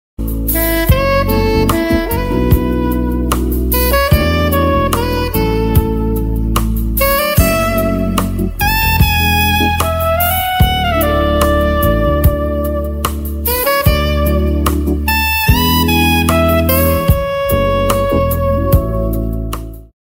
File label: saxophone ringtone, instrumental